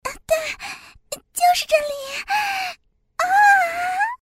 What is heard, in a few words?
moan; Imperial Girls